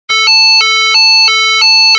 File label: musicbox